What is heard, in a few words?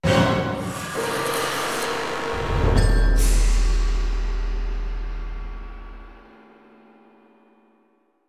Boss END